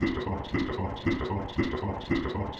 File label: Cogs